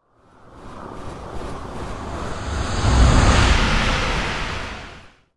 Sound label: spaceship